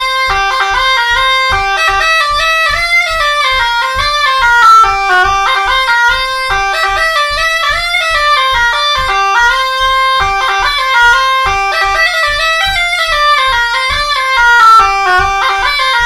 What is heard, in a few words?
mazurka